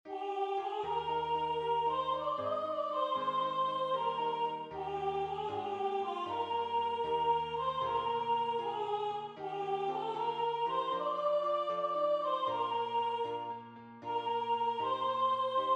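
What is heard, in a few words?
Suleika And Hatem soprano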